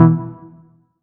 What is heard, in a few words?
Chord; ModelCycles